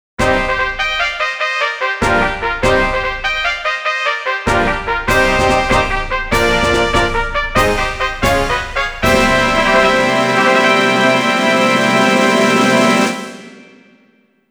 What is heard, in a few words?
Chukyo Special Race Fanfare; Kokura